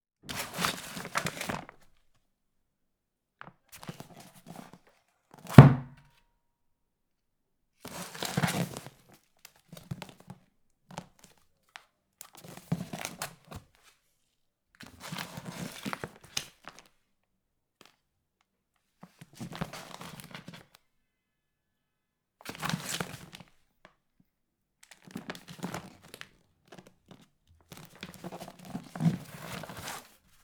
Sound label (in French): ANNUAIRE LIVRE OUVERTURE FERMETURE MANIPULATION PAGES PAPIER